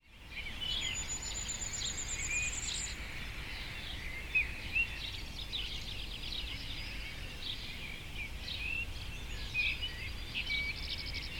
Vuurgoudhaan Regulus ignicapilla

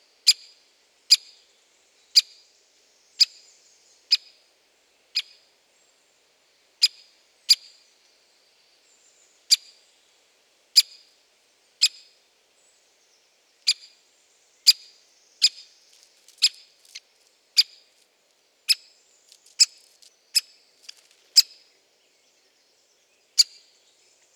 Grote Bonte Specht Dendrocopos major

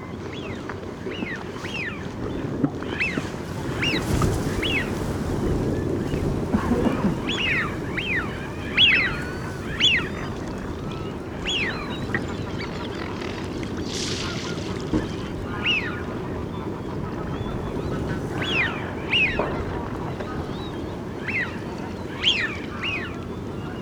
Smient Mareca penelope

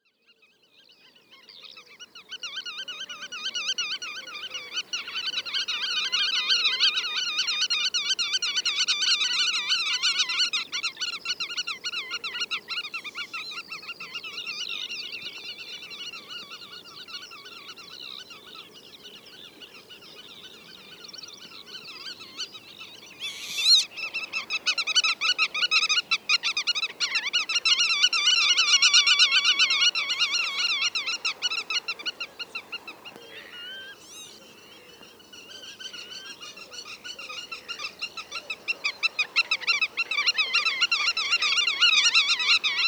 Grutto Limosa limosa

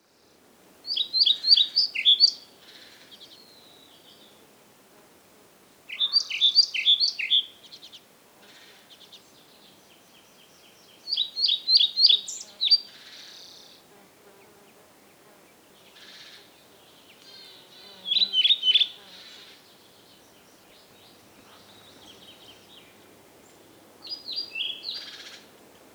Bonte Vliegenvanger Ficedula hypoleuca

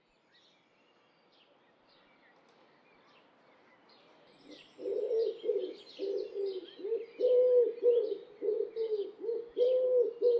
Houtduif Columba palumbus

